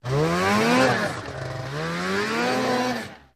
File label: Snowmobile Maneuver